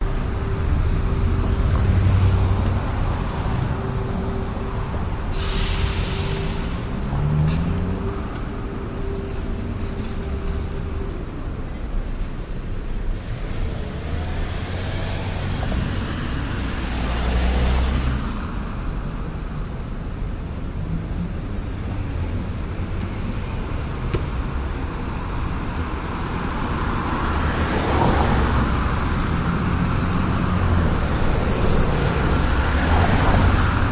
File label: vancouver night traffic hissing squeaking rumbling roaring traffic; pender st; howe st; cars busses; your free sounds